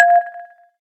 electric star, keytone; SKY IM-A730S-VEGA-S

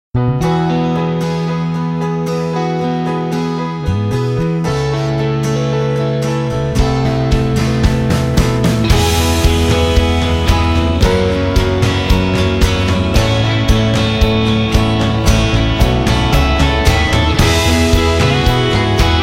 Rise Up, Nokia Tones

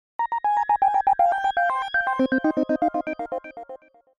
POWER ON OFF; NGM Vanity; MICROMAX

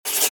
Key Tone

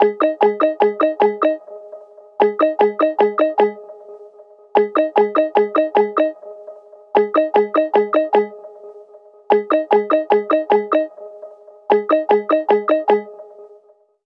ringtone cuckoo; The Light Phone II

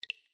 Unlock, phone ui; Itel; itelOS-13

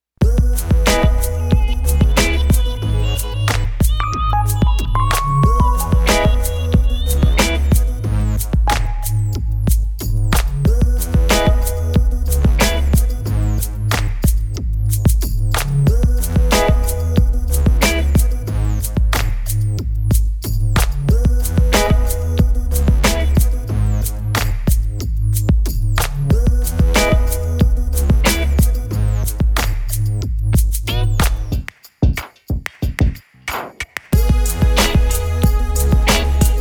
130 instrumental; Nokia; Nokia Design Team

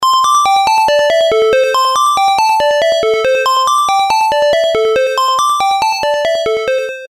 Echo tone; Samsung SGH-G400L SoulF

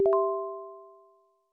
complete, headphone; Apple; iOS-18

